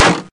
hurt

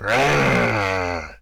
yeti roar

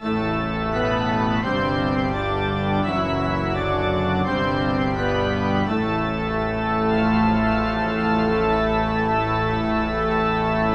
gameover castle

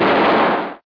explosion

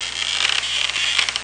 door

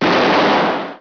explosion

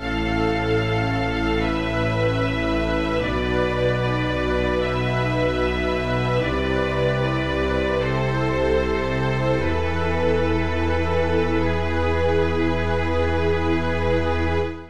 gameover ghost forest

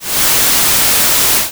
fizz

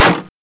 hurt

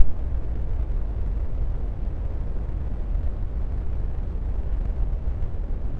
lava

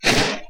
splash

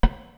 wood

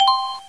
coin

